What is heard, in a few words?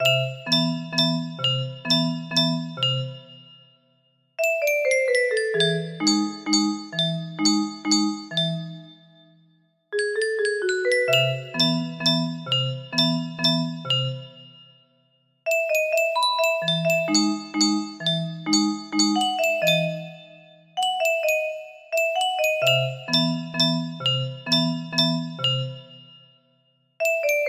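music box melody; deltarune; spamtons shop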